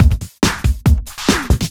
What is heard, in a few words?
Breakbeat; Vengeance Samples; VEC2 Loops